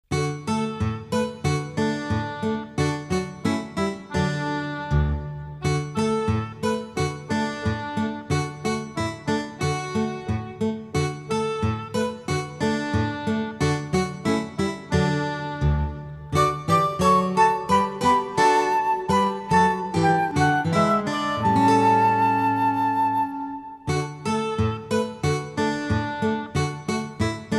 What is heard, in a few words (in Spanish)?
musica